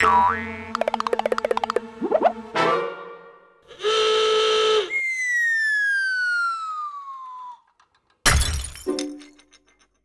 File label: Over the Cliff, cartoon caper samples